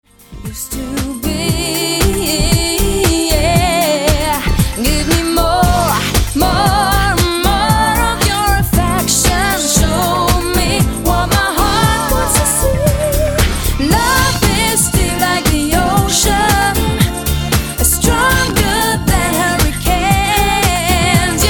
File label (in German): lied